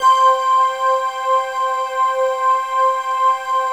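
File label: PAD, atmospheric pads; Best Service; XX Large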